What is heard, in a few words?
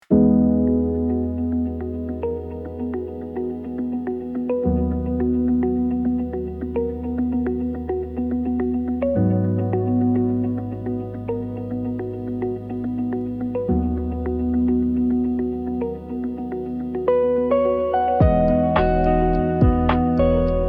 bg music